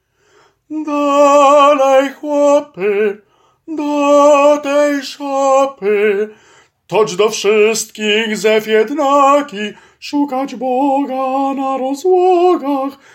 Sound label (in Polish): Tenor; Za gwiazda